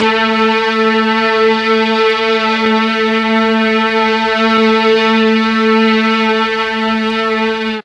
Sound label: A1 16 VIOLIN, mellotron; TRON 16VLNS